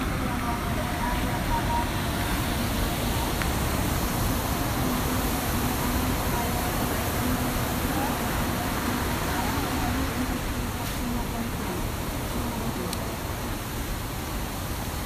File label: Tanah Lot Temple